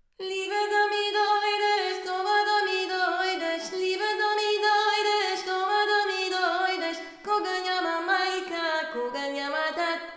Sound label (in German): 3stimmig